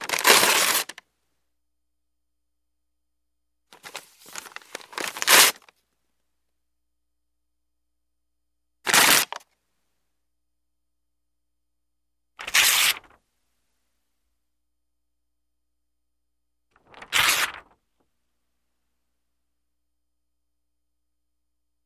Paper Rips

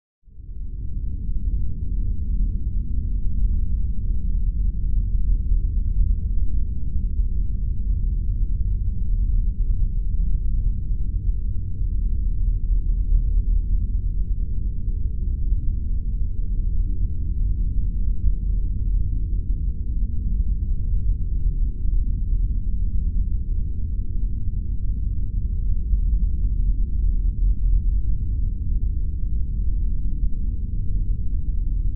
Ambience Nuclear Re

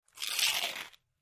Bite Many Potato Chip

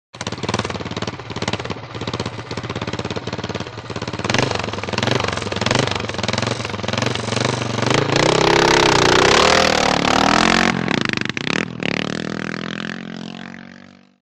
Away Trials Bike Revs

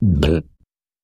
Cute Deep Burp; burping

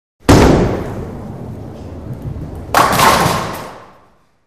Bowling Ball Rolls

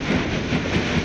Propeller Mvmt Above, propeller movement

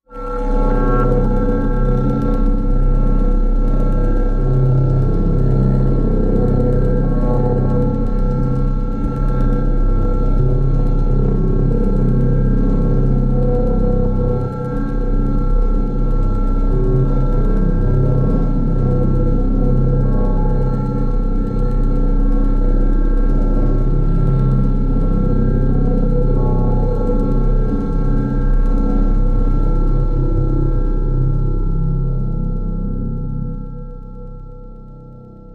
Idle Drone Low Radar Pulses Low Electric Hum; probes

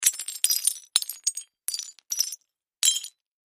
Wood Tile Sml Squares, debris

